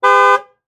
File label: Truck GM Envoy Honk Horn Short; transportation